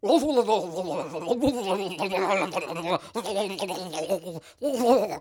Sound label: fluke charge